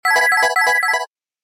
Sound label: Serene tone; Samsung GT-B5210U